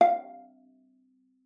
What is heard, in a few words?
F5, VIOLA PIZZ; Xsample VOL-5